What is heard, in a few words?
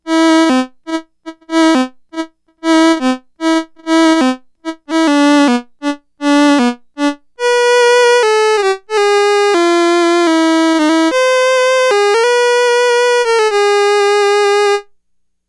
Violin, Roland SH-2000